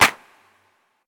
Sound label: ambient hand clap sound g key, Royality free clap one shot tuned to the G note; single hits one shots